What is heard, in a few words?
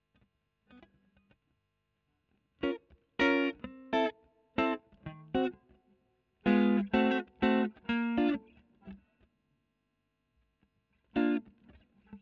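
Electric guitar Royer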